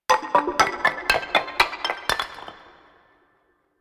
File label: Field Mat Tap; Yu-Gi-Oh! Master Duel